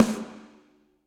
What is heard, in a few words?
Snare & Press Dark Plate Verb; Drum Hits; Ableton; Factory Packs; Session Drums Club; MPE Hits